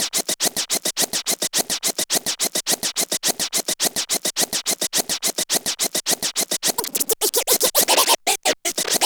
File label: Scratch Beat; Sonic Foundry (Sony Creative Software); Club Joints and Street Anthems; Lions Den